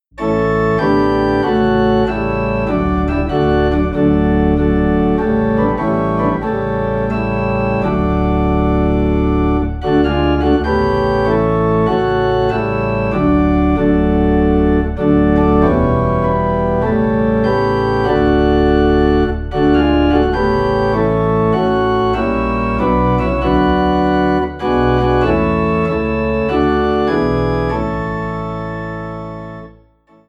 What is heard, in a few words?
Organ Low in D; In My Heart There Rings a Melody